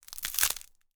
Bread, Pizza, Curst, Crunch, Focaccia, Baked, Burnt, Various; Mattia Cellotto; Crunch Mode